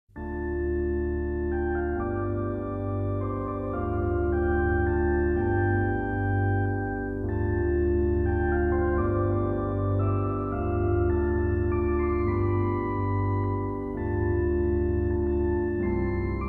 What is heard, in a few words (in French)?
interlude d'orgue